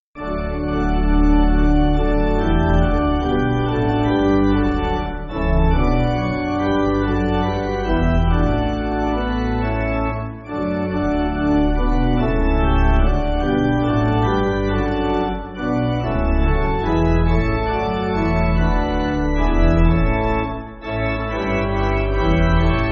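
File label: Pipe Organ, Backing Files for Churches; O Christ Give Us True Peace; Norén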